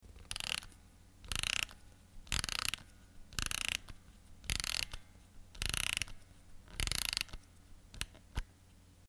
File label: winding a music box